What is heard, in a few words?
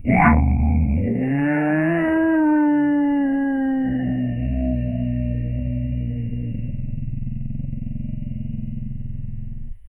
aquatic monster short